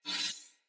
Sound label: Dagger Whoosh Accent Heavy, Swords; Weapons; Player; Josalfar